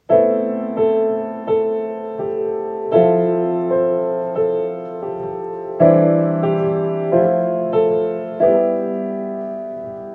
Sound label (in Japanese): 子もり歌